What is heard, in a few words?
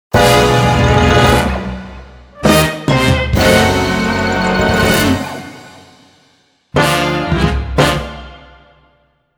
Evil Stings